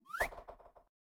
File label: move coin; fruit master